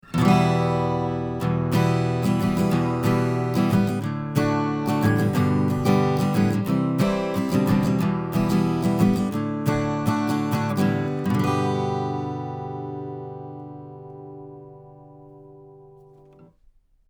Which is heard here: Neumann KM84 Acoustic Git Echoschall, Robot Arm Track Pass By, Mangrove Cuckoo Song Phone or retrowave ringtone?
Neumann KM84 Acoustic Git Echoschall